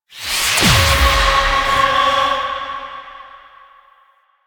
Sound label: Choir Chorus Cast Life; Warframe